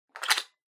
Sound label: Tabletop Timer Digital Battery Cover Open; 卓上タイマー デジタル01-08(バッテリーカバー 開); タイマー機器